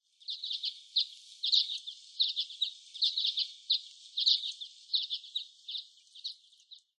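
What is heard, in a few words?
Common Redpoll; benihiwa; nemuro; koe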